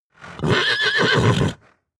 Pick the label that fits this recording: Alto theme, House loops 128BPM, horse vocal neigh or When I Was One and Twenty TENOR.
horse vocal neigh